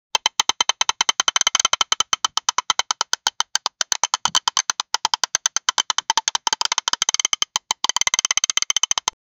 horror clicking sound of, activate

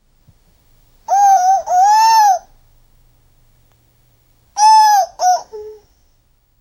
soft moans of the baby; bird call

